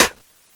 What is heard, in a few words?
feather spit; blackquill; unchained